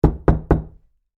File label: Door Knock Hollow, Foley, Door, Knock, Hollow, Wood, Door, Basement; Door Knocking Sound Effects